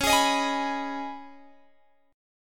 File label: Db 7th Suspended 2nd, Guitar, Open G